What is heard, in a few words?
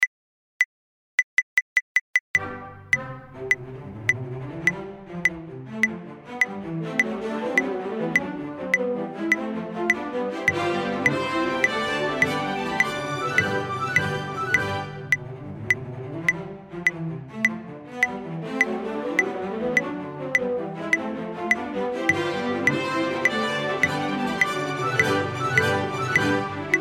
double bass, 310 BPM with click; BEETHOVEN no5; digitalorchestra; symphony no 5 movement 3